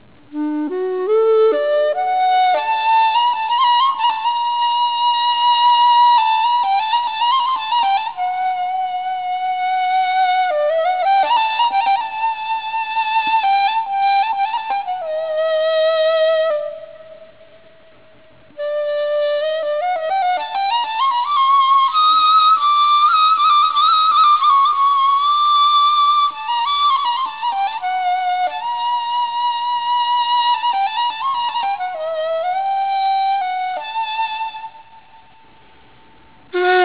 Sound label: CHAHARGAH NEY